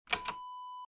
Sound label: button switch